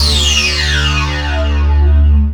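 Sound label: synth, Production Effects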